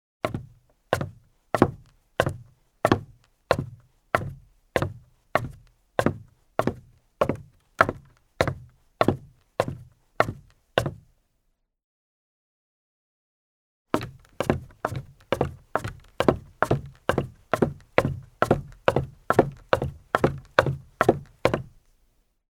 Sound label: footstep, Footsteps; BBC 55 - Footsteps 1; BruitsDePas